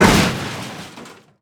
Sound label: explosive objects; World of Tanks